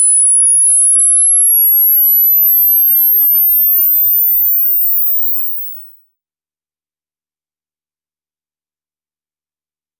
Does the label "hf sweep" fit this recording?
Yes